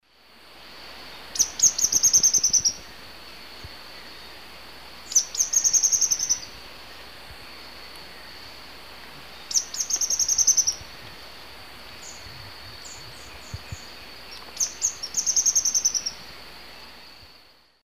Epinecrophylla ornata, song male; S. America; Eastern Lowlands

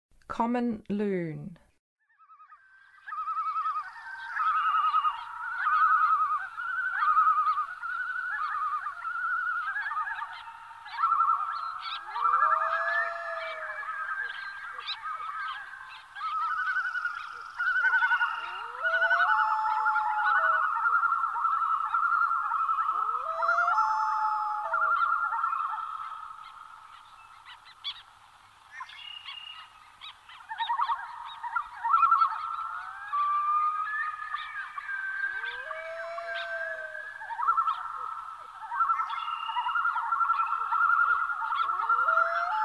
Common Loon; North America; Western USA; Western USA Songbirds

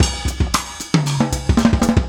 block

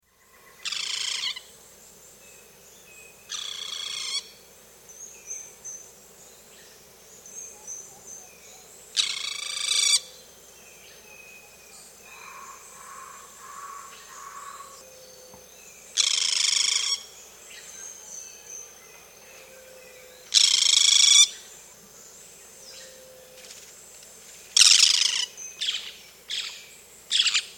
Boat-Billed Flycatcher; S. America

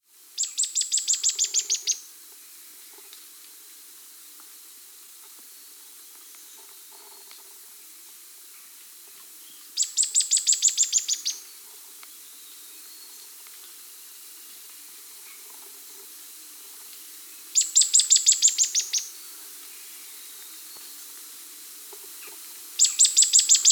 Streaked Xenops (Xenops Rutilans), Andean Birds; S. America; Natures Songs

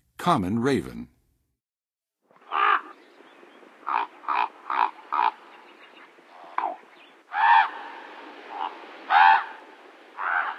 Common Raven, Western USA Songbirds; North America

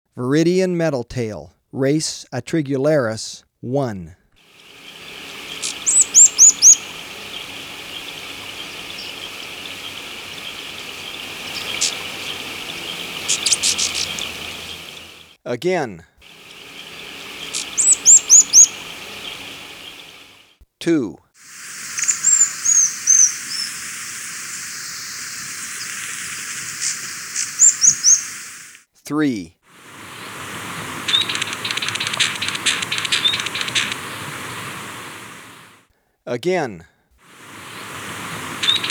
Viridian Metaltail (Atrigularis); S. America

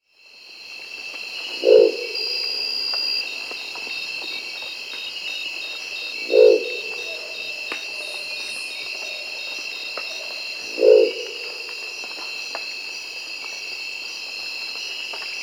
Crested Owl (Lophostrix cristata), Peru; S. America

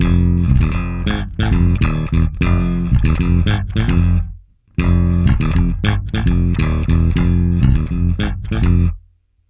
Bass F Funk

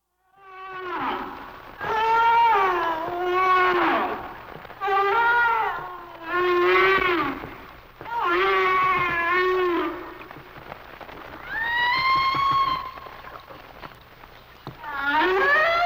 Sounds of Nature - Humpback Whales

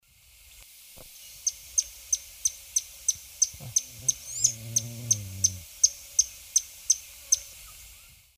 Todirostrum chrysocrotaphum, song; S. America; Eastern Lowlands

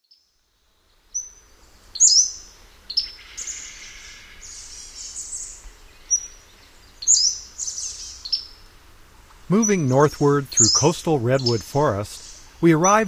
Pacific-slope Flycatcher; North America; Common Western Species; Know Your Bird Sounds

